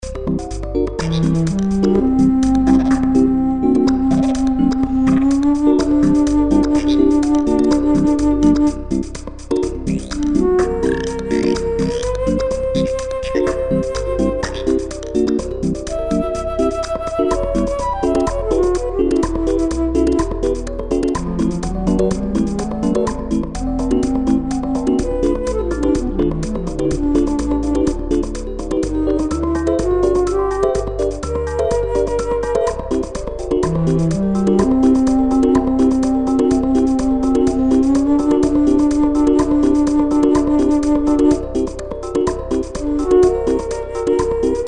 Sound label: Sing